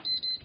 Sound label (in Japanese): ピピ・・・, 生活音; 生活音系効果音